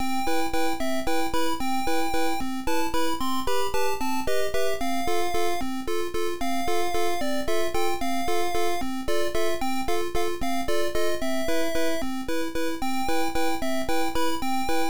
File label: Round BGM; super boy 3; msx